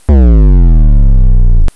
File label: bass